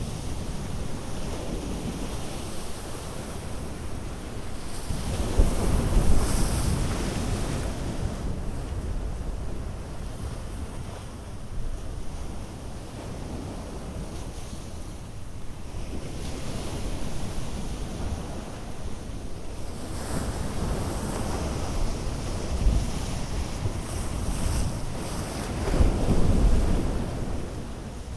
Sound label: beach; Golden Crayon Sounds